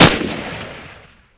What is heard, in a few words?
dragunov fire, guns